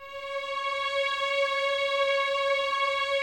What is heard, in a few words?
C#5, 11 Violins, Soft; Miroslav Vitous